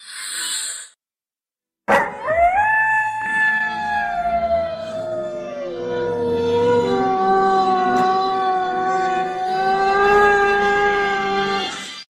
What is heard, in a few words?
Howl No Horse; Holo